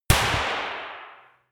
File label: gun clapping pop gun; schusswaffen